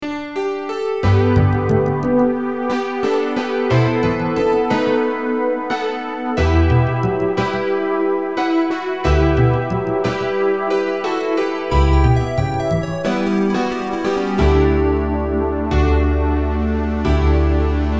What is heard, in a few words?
piano; Falcom; Sorcerian